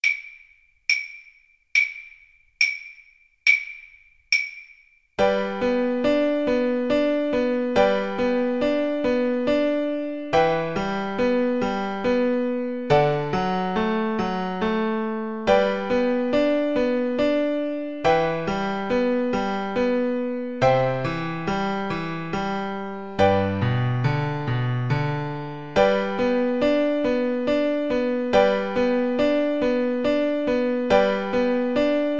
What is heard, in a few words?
arirang kar, Flute